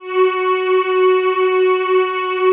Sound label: ATMO PADS; Best Service; XX Large